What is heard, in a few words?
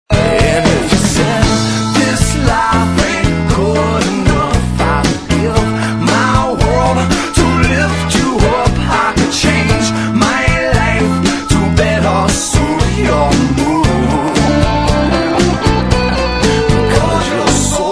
Smooth loop